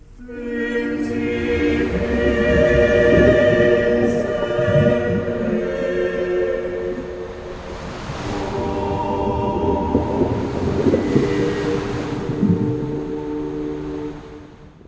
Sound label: harmonize